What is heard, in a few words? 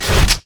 charged shot; LoL; graves